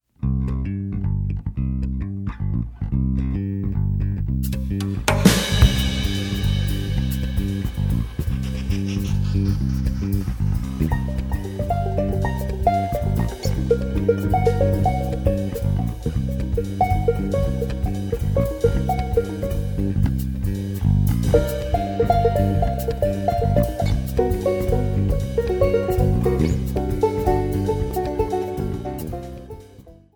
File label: jingle